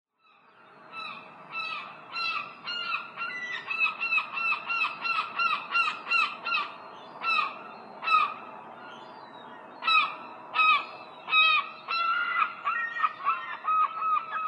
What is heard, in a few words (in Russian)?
Serebristye chaiki